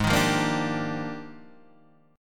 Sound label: F Minor 13th, G sharp